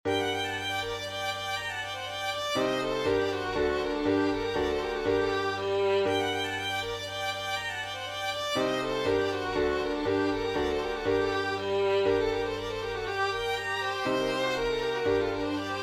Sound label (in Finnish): speli